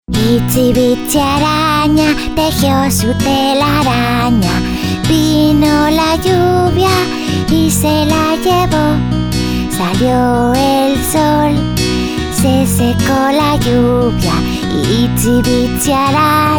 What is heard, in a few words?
mix songs cartoons